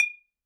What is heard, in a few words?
Bottle Struck